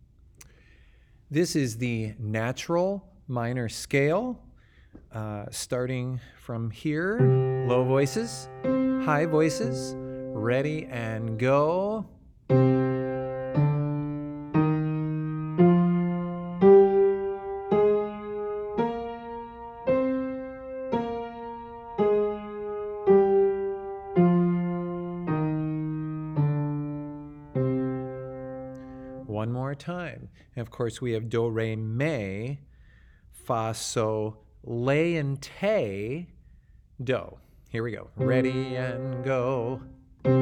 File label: Natural Minor Scale; choir